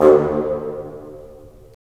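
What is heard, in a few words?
WND BN, wind bassoon; LA Composer vol. 3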